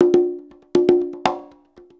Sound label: 120 CONGAS, Percussion Loops